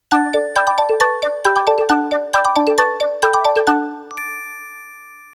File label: Progress, ringtones